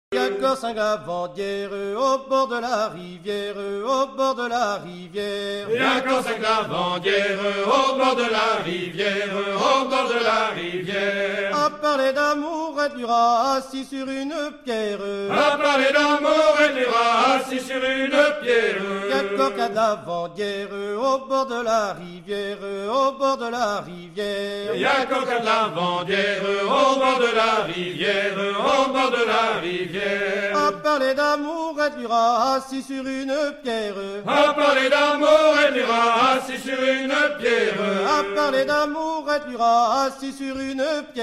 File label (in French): chant